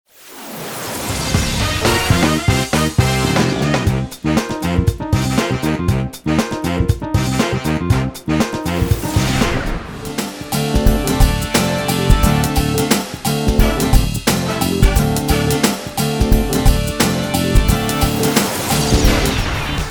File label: ukazka funky